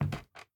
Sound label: Wood soft